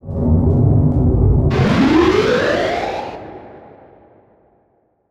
Transition Whoosh Alarm; Production Element

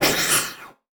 Multimedia Internet CD-Rom Flash Blast

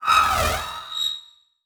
Multimedia Internet CD-Rom Flash Whoosh Multiple

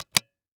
Technology Electronic RCA CD Player Closing Fast

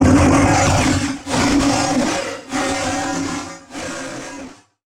Transition Delay Medium

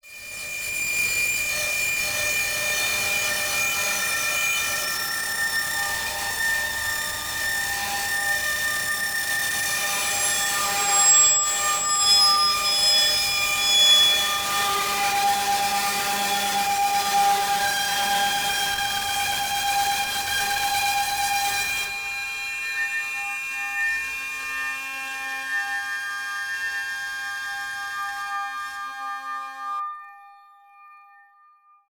Screech Digital Noise; Adobe; Production Element; Imaging

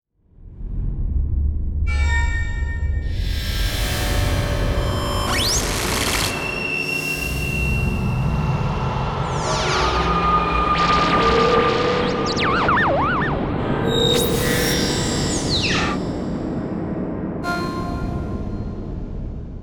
Electronic Whoosh Reverse Feedback Digital; Adobe; Production Element; Imaging

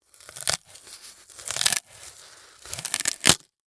Industry Tool Scissors Cut Styrofoam

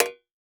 Impact Shovel Hit By Plastic Tube

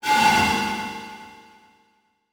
Title Transition Metallic; Production Element

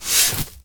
Industry Tool Shovel Throw Sand

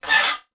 Multimedia Internet CD-Rom Flash Wipe Short

